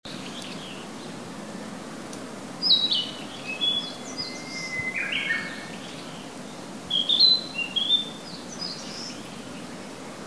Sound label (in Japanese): kibitaki; キビタキ; 今年もキビタキに出会えた